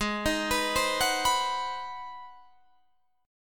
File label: Ab 7th Sharp 9th Guitar Open D, chord